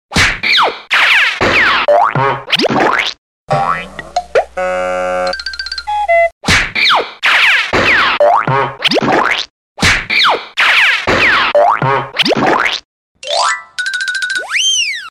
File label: Funny Cartoon Effect